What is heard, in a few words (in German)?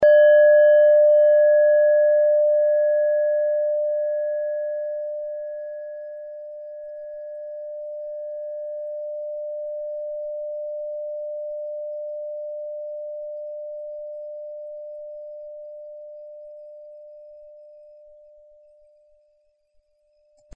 klangschale nepal, Klangschalen Nepal